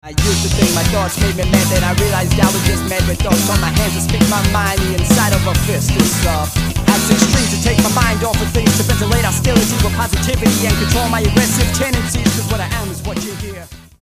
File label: rhythm; musicianshotlinemagazine